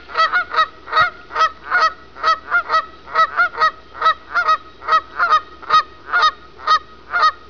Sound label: canadian goose